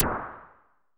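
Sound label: Nord noise, techno; Drums In Your FACE!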